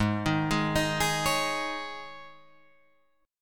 Gsharp Suspended 4th, Guitar, Drop D; 6,6,6,8,9,9

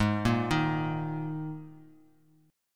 Ab Suspended 2nd; arpeggiated; JGuitar

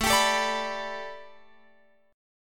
A 7th Suspended 2nd Suspended 4th Guitar Open F; A7sus2sus4 strummed

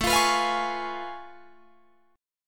A Augmented Major 9th A down Guitar Drop D

